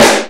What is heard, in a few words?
SNARE C3; Greytsounds VOL-1; SDX SNARES